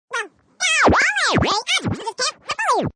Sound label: Record Scratching, Well, golly, stereo separated, scrubbed, high pitch, FX, (human voice); Warped